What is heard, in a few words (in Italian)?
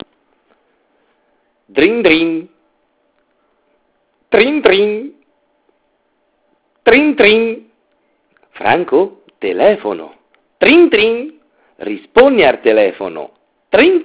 ER PEGGIO SQUILLO, suonerie; cellulari